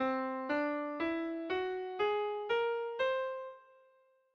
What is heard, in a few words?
Dom Whole Tone 6 tone scale